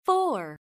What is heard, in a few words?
Key Tone